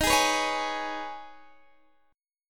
D sharp 7th Suspended 4th Sharp 5th, Guitar, DADGAD